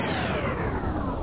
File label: JET PAK OF; duke3d